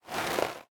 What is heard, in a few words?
armor; smite; chaac